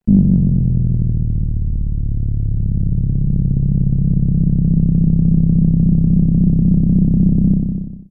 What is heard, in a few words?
engine